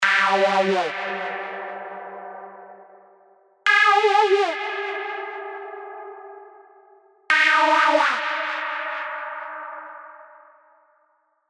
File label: FX Oh Wow, SERUM